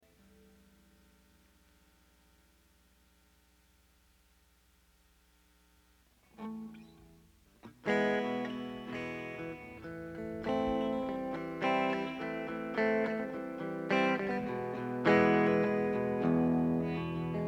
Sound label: Drum Samples Mix